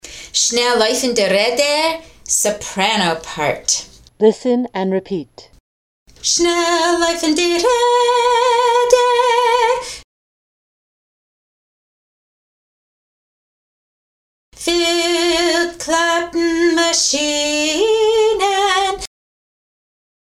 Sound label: soprano drill; Shnel Loyfn